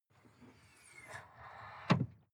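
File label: sports car exterior trunk hydraulic; verkehr-transport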